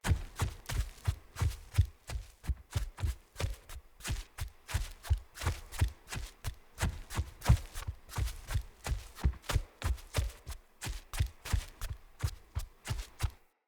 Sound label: Wolf Steps Jog Loop; Animals; Footsteps